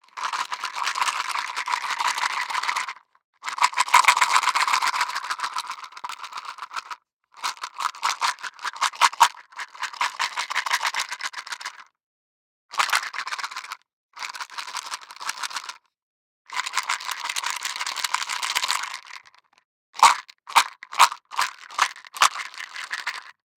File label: DICE in Plastic Cup, Shake, 16 Sixteen Dice; Samples; ArticulatedSounds; DICE Sfx Party (Extended)